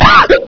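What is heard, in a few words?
hiccup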